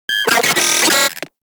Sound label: Vocal Pain; Moa; Vocal Set D